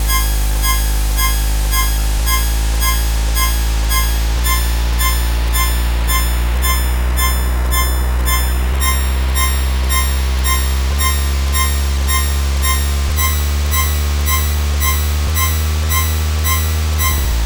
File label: 8bit bgm normal